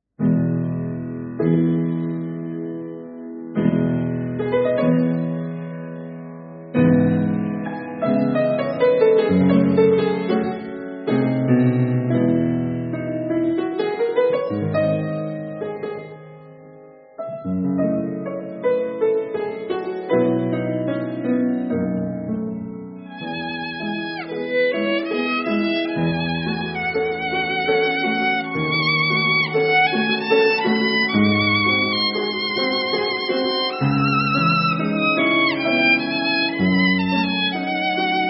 скрипка; М. Балакирев; Экспромт

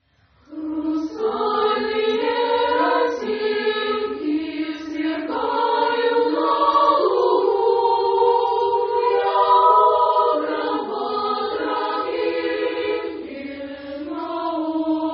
Хор старших классов, Финская нар. песня; Обр. Г. Струве; Над озером

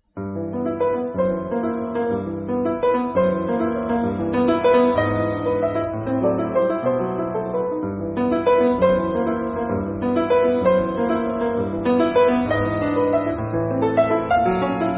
фортепиано; Ц. Кюи; Вальс e-moll

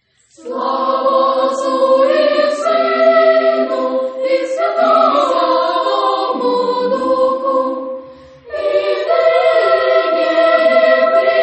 Хор старших классов, 3-х голосная литургия; Играют учащиеся и выпускники; Д. Бортнянский; Слава отцу, Единородный сыне

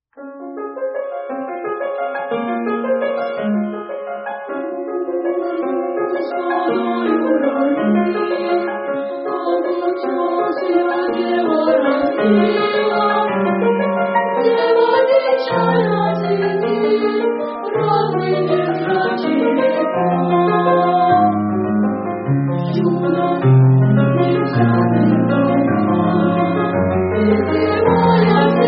Хоровая капелла мальчиков; Ц. Кюи; Царскосельская статуя

